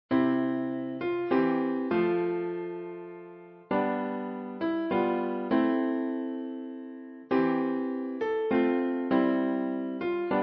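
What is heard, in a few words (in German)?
Hohe Stimme; Silent Night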